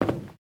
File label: Footsteps, Human, Metal, Run, Isolated Steps; Backrooms 2D